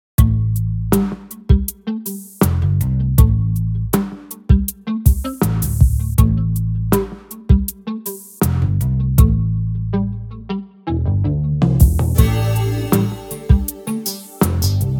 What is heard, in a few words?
quasi jazzy